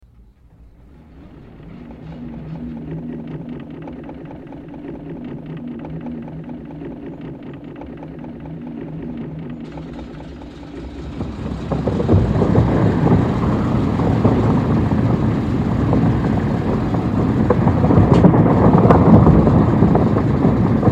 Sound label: building demolished